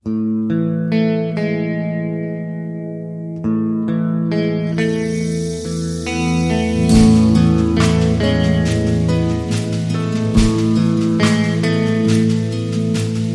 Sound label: Guitar Percussion Piano; 60sec